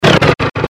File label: good pain; CAC ASSETS